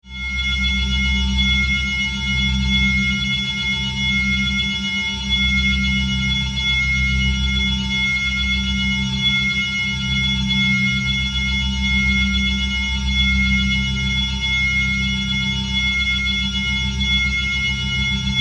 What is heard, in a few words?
Drone, High, Pitch, Ring, Scifi